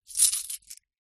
coins movement hand; foley

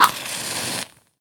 household match strike; sound effects one

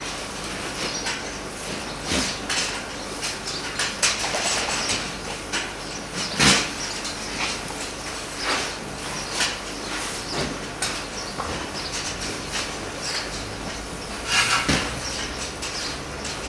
ambience farm goats stall feeding; sound effects one

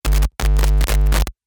Guitar Computer Jack Noise

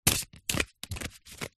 cards game shuffle; zapsplat

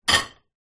household dish place into dishwasher

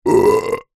human male 37 years old burp

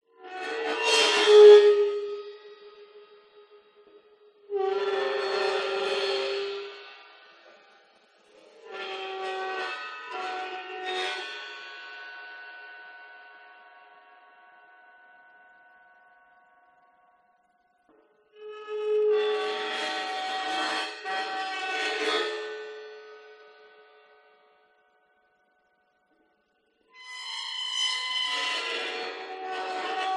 Cymbal; audio hero

